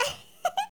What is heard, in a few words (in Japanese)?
syozyo warai